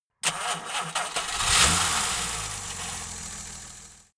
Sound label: car jaguar e-type startup engine